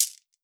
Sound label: shek, WORLD PERCUSSION; Best Service ProSamples vol.52 - World Instruments 2; MIXED PERCUSSION